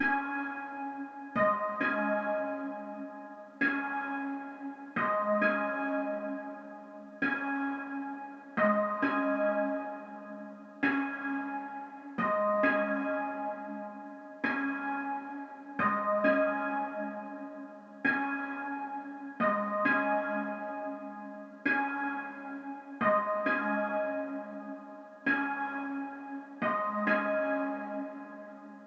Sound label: 133 BPM C# Min Pluck; Trap; Melodies; Loop Stems; Cymatics; Trap Starter Pack; Harm's Way